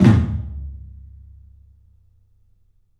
TOM, 3D Drums, CLUB TOM; 3D Sound; CLUB 14TOM

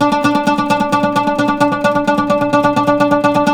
PLK OUD, Roland LA Composer

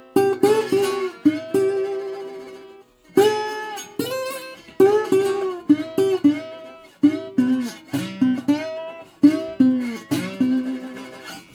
120, Blues Guitar; ILIO - Fretworks

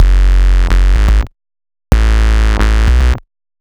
Distorted Phunk, Bass; Sonic Foundry (Sony Creative Software); Underground UK House & Electro

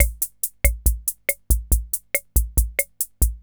140 POPS

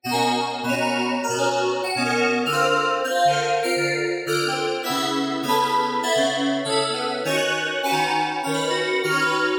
random overtones pad

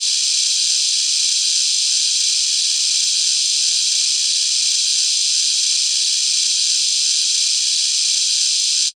Digi Breath; Roland BK-5

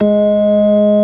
ORG.3 GIS3; B3 FULL

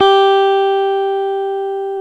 GTR, Dual Guitar; Club-50 - Foundations Roland

